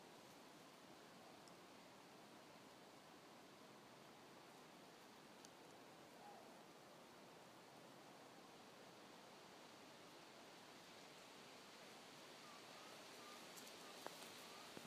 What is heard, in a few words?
Aspens Quaking